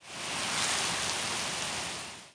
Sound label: reed farm; PHARAOH